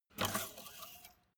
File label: car bmw e46 exterior oil rod pull out